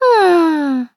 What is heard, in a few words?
hm; mai natsume